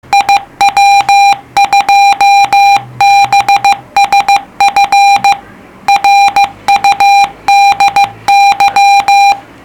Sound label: cw; IW2BSF